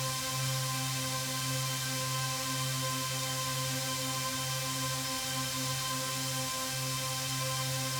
Drone; stereo toolkit samples; Non Tempo Loops

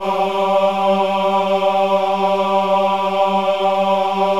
VIB G3, VIBRATO, Voices; Spectrasonics

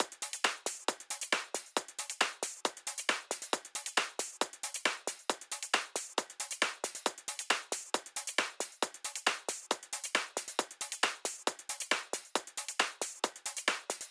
136 Hh; Zero-G Trance Inducer; Construction Kits; 136bpm

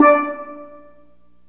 steel drum (D); Sonic Foundry (Sony Creative Software); Ugly Remnants VOL-2

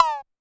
Cowbell 808 Down Slow, Pitch Env; Clavia DDrum-AT; Pitch Env Various

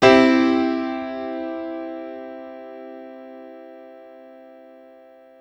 Piano stack (C) maj (triad); Sonic Foundry (Sony Creative Software); Ugly Remnants VOL-2

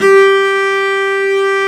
cello, Arco Solo; String Master Series

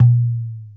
Dumbek, World Percussion One Shots; Sony CS

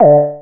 Anasound Short hi D; Sonic Foundry (Sony Creative Software)

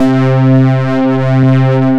Synths Note C

